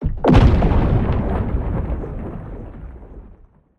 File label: Large Underwater Explosion